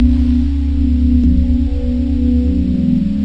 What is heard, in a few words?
the dream click bass; LOOPING AMBIENCE